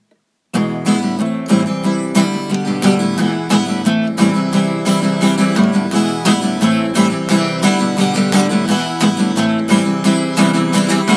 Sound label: acoustic